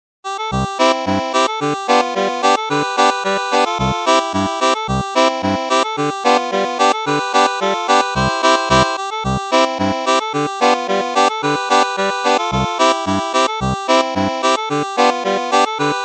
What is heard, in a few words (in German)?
Boarisch Polka, steirische Harmonika